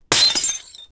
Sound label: the sound of a heavy, breakage